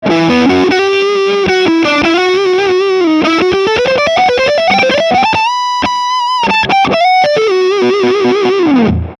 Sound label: mesa boogie mini rectifier twenty-five channel 2 modern lead front pu